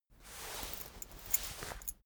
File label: key ring small trousers pocket fetch